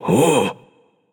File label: grunt lift; smite; voicepack; hachiman